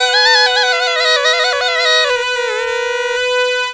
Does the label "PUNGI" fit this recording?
Yes